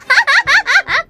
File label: laugh; omaru polka